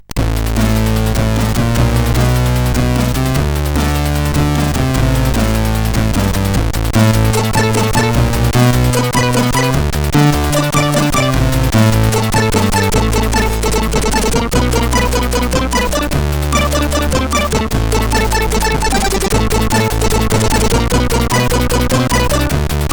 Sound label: tune, CSG8580R5; Tripler tune 3